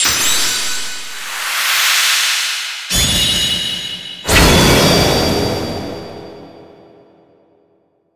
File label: Fusion Summon; Yu-Gi-Oh! Duel Monsters Saikyo Card Battle; 3ds gamerip 2016